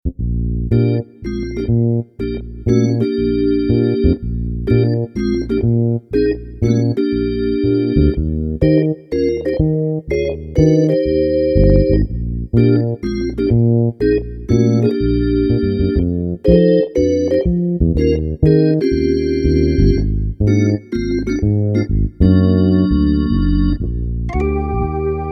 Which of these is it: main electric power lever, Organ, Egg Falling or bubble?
Organ